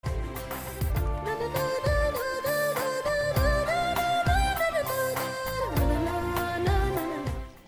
music bit

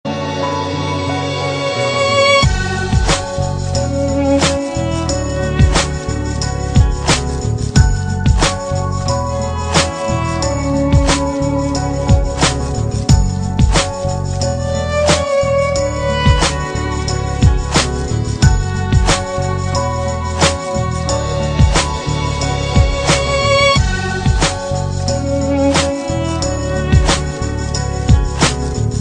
sad ringtone; hip hop

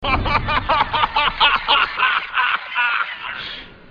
laugh; alternative

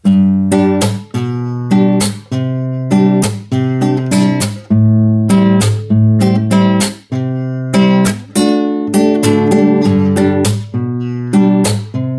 Acoustic

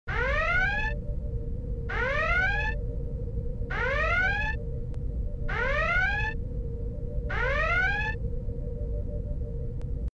red alert; TNG

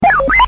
Scroll Wheel

